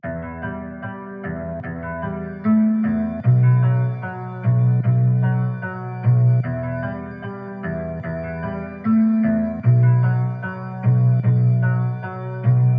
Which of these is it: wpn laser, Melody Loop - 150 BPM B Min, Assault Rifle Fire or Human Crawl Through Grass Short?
Melody Loop - 150 BPM B Min